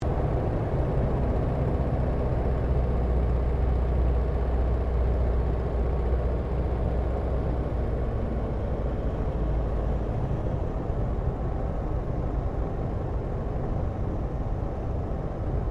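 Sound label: 1916 Sopwith Pup replica touch n go interior, airplane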